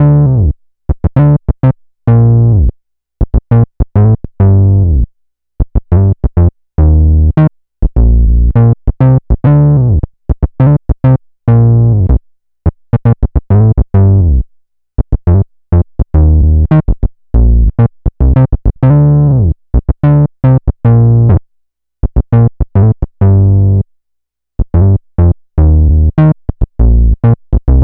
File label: Synth loop 102 Bb, Synth Bass Loops; Slate Digital BASSHOLE Sample Pack